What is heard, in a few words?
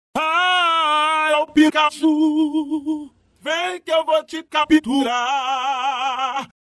aaaaaaaaaaa e o pikachuuuuuuuu